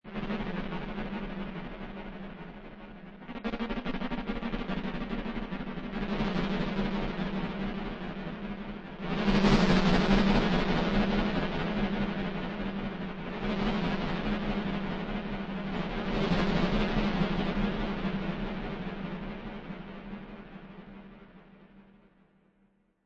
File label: Space Insects, Ambience with noises resembling insects; Noise of aliens or other animals. Suspenseful.